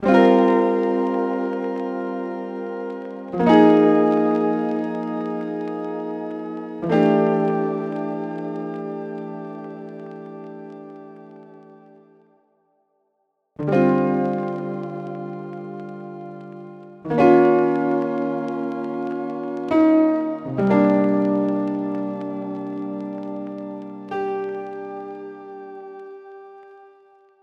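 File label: 70 piano C minor; cardamom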